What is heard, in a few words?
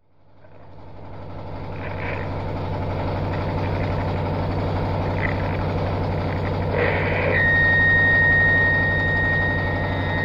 industrial soundcheck; radhakund